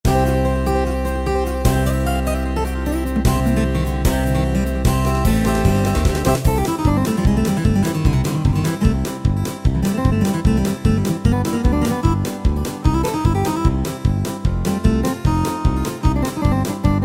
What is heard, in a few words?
Bluegrass, Yamaha PSR-3000 country style; Factory Styles